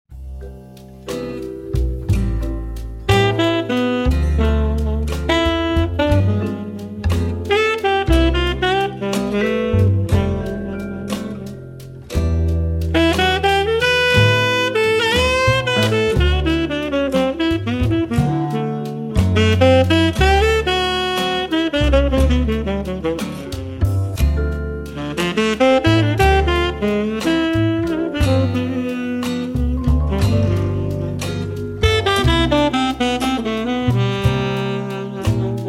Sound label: Chill Atmosphere